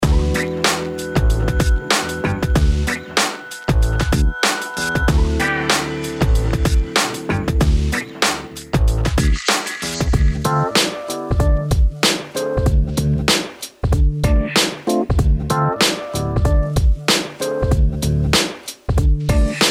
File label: Boom Bap